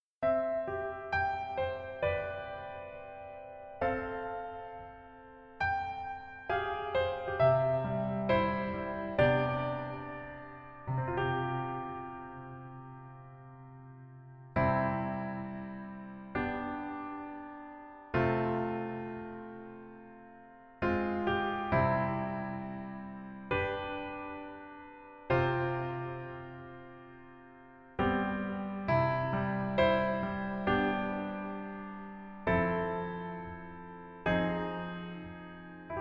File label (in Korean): Piano ver, 여자키